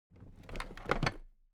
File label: object case toolbox raise regular; smartsoundfx